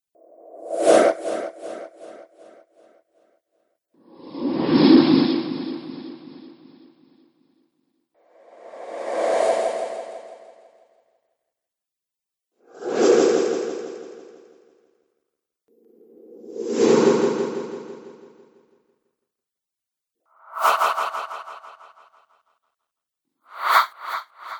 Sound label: Strong Wind Whooshes; Whoosh Sound Effects